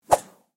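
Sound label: spear sounds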